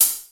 nice foot cymbal f key, Royality free hi-hat sound tuned to the F note. Loudest frequency: 9715Hz; hat samples